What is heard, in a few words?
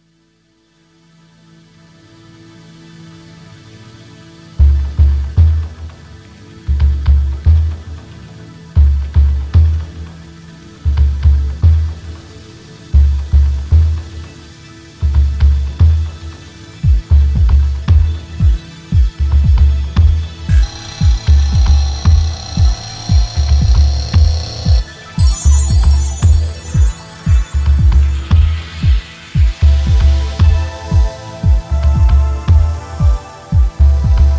trance fusion